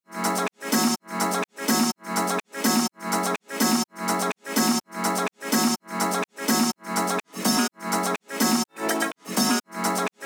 125 Loop Groovin F min

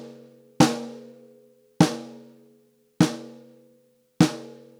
Snare 100BPM Acoustic Clean

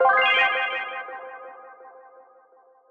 F m Keys Sprinkle

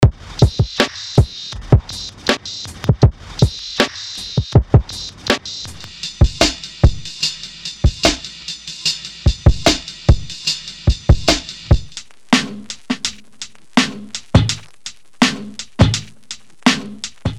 D boom bap box

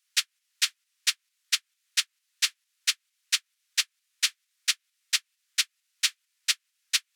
Hihat 133BPM Dark Analog

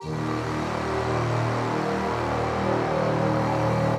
D Lead

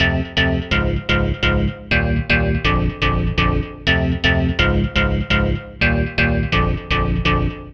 G Soul Synth, 124

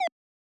siren beeps dry; Deep Space Textures V2

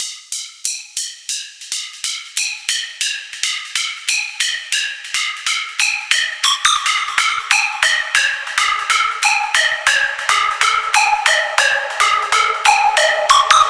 140 SYNTH

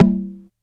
One Shot Perc

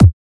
Dynamic Kick

